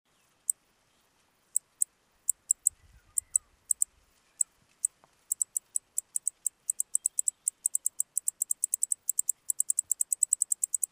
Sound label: Decticus albifrons; BIOAC ORTH